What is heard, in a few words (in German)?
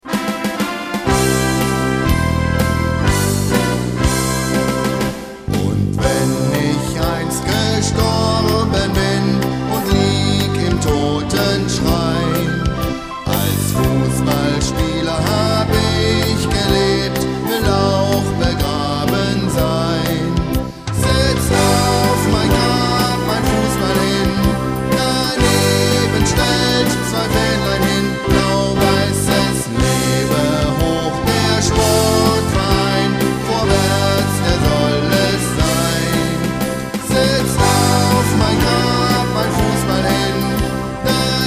Lied Gesang